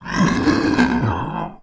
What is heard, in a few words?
moan; The Elder Scrolls III - Morrowind